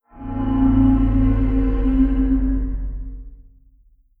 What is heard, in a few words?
distance god ray hit layer; apocalypse